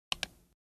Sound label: click, mouse; マウスのクリック音・単発